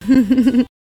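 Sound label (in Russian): дама хихикает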